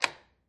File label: Button Pushes Metal